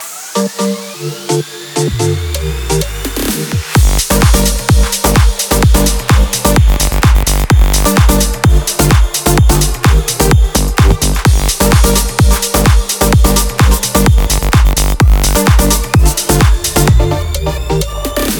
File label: Synth Trailer